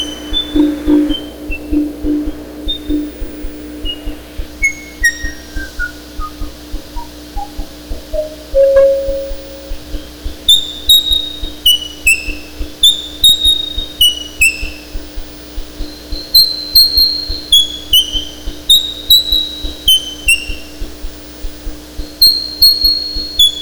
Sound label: feedback